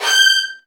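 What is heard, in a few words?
violin, G4, right; Virtuoso Strings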